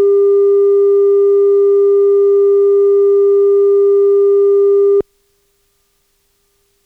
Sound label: 0dB 315Hz